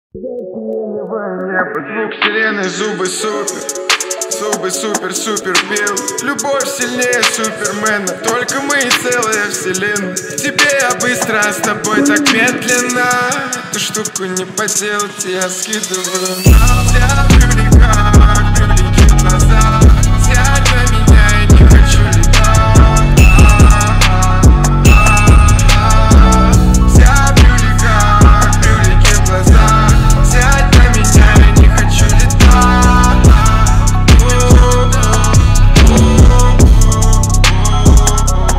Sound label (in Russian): atmosferniy bassboost